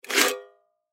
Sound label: Tin Small Pick Up; Tin Pick Up Scrape; Pick Up Sound Effects; Foley; Objects; Blastwave